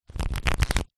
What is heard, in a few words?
leisure game cards flick through fast; uno